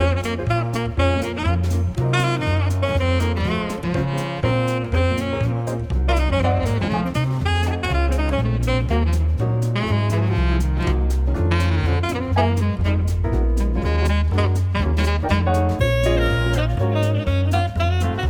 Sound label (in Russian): Музыка для чтения желобок Атмосфера